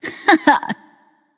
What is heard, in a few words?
hehheh; smite; voicepack; izanami